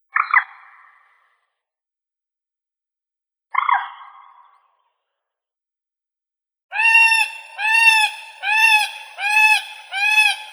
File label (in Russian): kamishnitsa gallinula chloropus